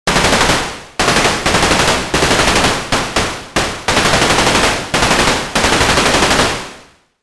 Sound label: machinegun; 攻撃